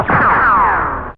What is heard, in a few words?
Ricochet, Fairlight CMI IIe Effects